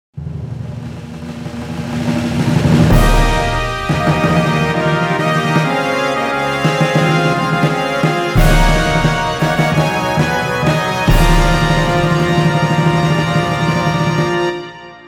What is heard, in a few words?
fanfare; Hubert Poell